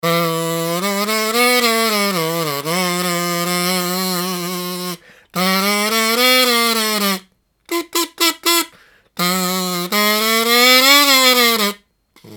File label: KAZOO